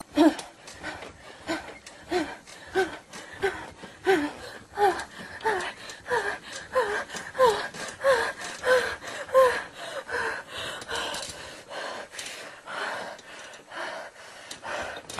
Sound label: Female Having Intercourse